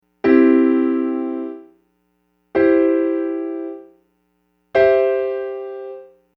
Root First Second; Closed

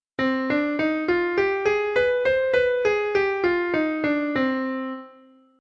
C Harmonic Minor

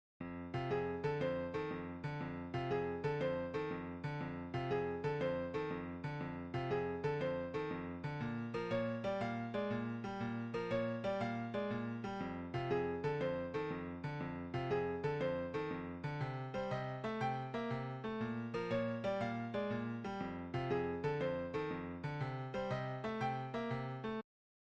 Simple Blues In F; 16Blues

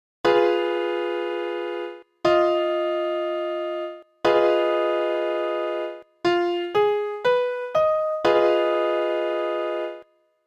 Dim Min7

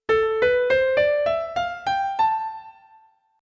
A Natural Minor

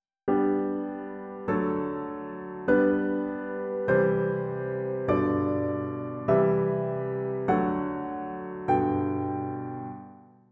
chords

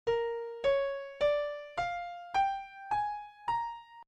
Blues Bb; 16Blues

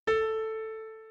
Soft Piano

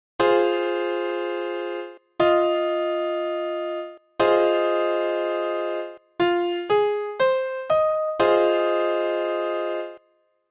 Min Min7

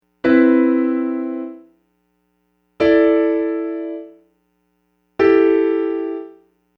Not Triads

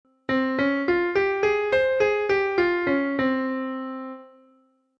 C Pentatonic Japanese

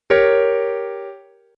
G Diminished Triad

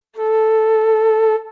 Flute

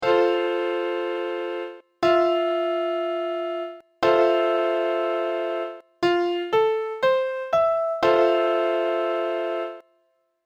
Maj Maj7

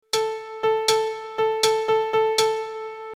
Click Track

